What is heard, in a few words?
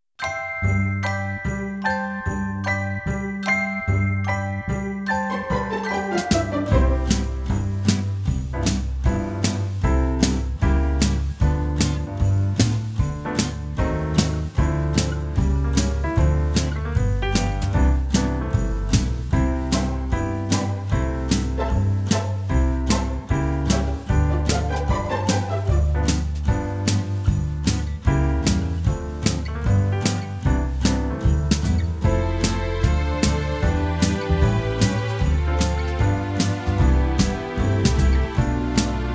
Instrumental